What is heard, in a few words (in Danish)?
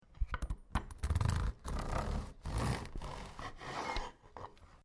skære Brød